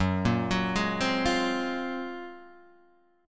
F sharp Major Sharp 11th F sharp Guitar Half Step Down